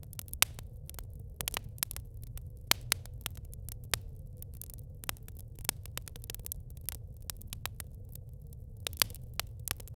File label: crackling fireplace